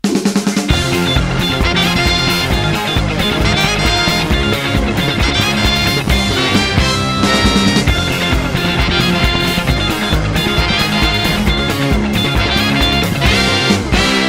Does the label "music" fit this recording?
Yes